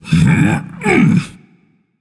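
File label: non-verbal grunt lift; smite; atlas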